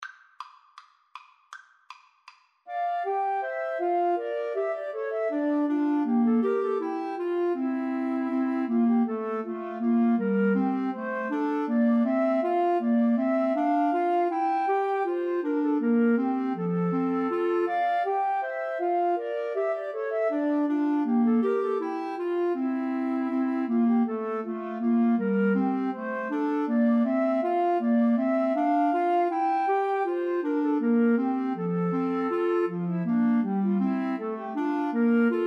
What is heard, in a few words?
bourree, 2 Flutes Clarinet